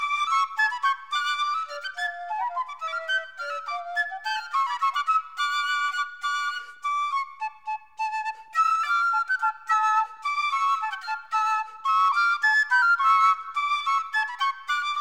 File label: Tickle